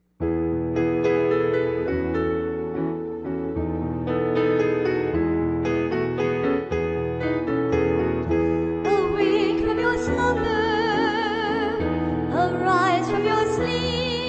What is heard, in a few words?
Melody (Soprano & Bass); St. Anthony of Padua - Choir Sunday